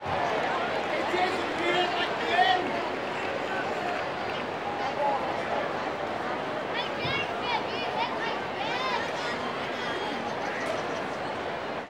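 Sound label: baseball crowd ambience chatter; SFX Kit; Crowds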